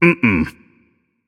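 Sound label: nonverbal disagree; voicepack; smite; merlin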